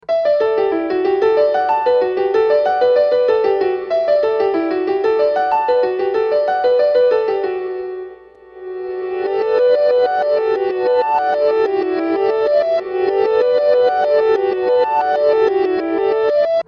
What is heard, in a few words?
reverse arpeggio